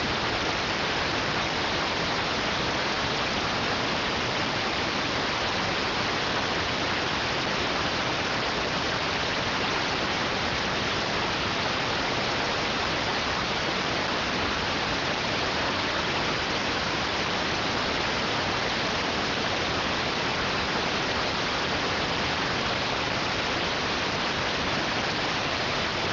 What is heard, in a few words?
rtty rx